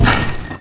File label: debriz, weapons; Dystopia